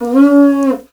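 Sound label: Afro Horns, horn phrase; Roland LCDP11 Africa VOL-1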